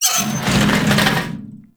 Trashcan; Warframe; Zariman

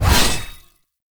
Blade Swipe; Warframe; Dagath Blade And Whip

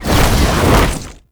Movement Dash; Warframe; Uriel

